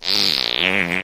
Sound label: fart long